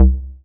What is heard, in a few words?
BD, Dance Mega Drums, HIP